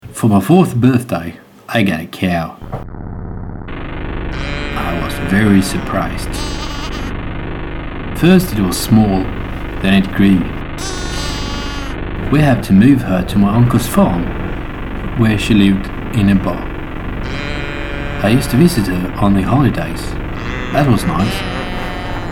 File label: Cow in a can